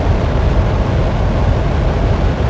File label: booster thruster stereo; OrbiterSound